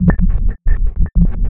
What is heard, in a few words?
Processed Perc; Sonic Foundry (Sony Creative Software); Electrocution; Beat Components